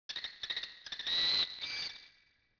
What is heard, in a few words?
hawk; Ambient; MX vs ATV Unleashed